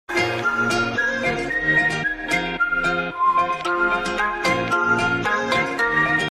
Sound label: best music box